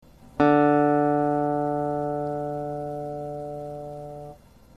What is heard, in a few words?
Tuning D, D string (the fourth string); guitar